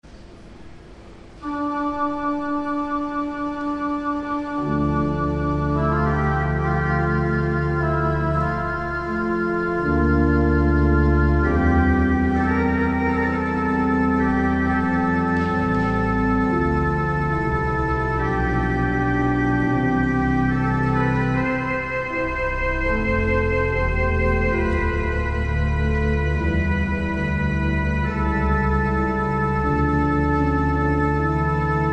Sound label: Organ, Chorale Prelude; Dietrich Buxtehude; 'When Jesus Went To Jordan's Stream'